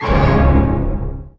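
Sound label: orchestra hit, ensoniq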